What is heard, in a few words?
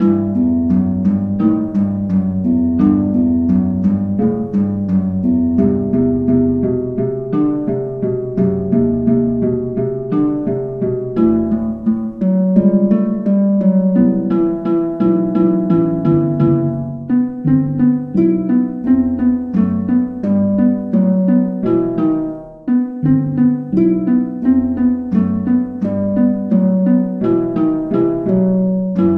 Harp; Sanctuary Score; The Bard's Tale Remastered Trilogy